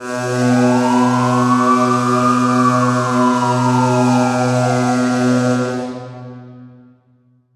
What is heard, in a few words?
Hockey Goal, Hockey Horns & Buzzers; Los Angeles; SFX Kit